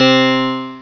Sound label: Harpsiclav; HyperComposer DEMO